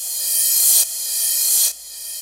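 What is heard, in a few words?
crash reverse, Dance Mega Drums; Best Service; Dance Mega Drums CD2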